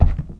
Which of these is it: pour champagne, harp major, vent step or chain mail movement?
vent step